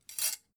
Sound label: dough hook grab